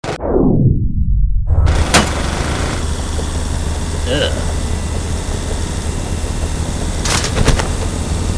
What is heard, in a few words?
Vehicle